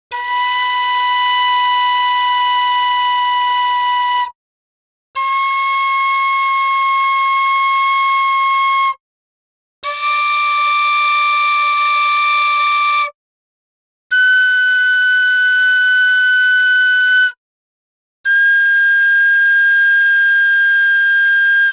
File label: Gamelan; Propeller Island - Complete Gamelan